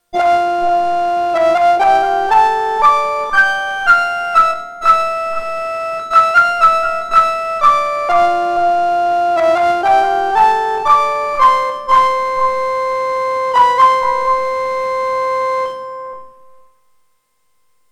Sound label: Neutron Flute Lead; Behringer